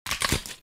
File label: bone crunch; lego